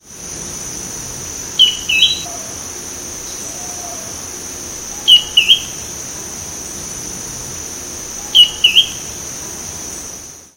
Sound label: Drymophila ferruginea; PE Cantareira SP